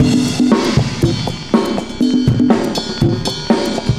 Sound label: cooling 120, loops, 120bpm